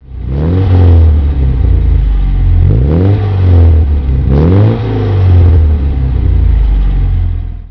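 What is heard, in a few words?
Lancia Flavia Coupé; Lancia Flavia Coupe 1800, 1966; Engine sound; V3D/ ClassicarImages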